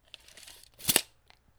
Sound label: tape measure sound effect; metallic